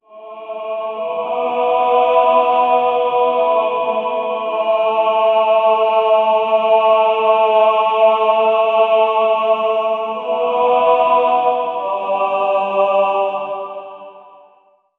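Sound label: male choir heavenly ambient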